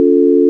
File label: sine